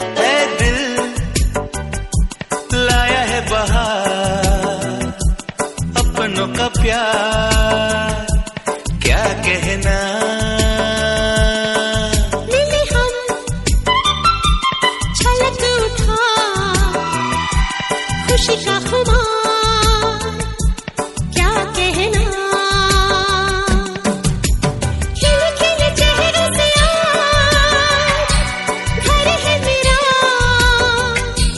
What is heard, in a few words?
Welcome Baby Song In Hindi